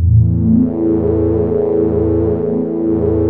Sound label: JUP.8 G3, JUPITER 8, Rare Synthesizer; Hollywood Edge - Giorgio Moroder Rare Synthesizer Collection